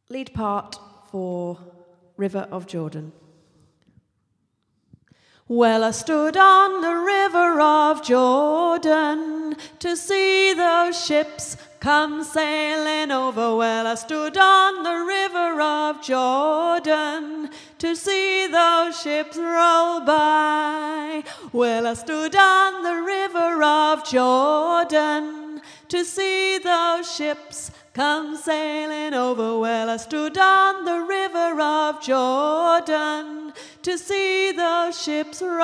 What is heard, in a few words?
alto, gospel choir; river jordan